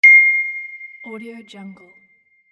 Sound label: ding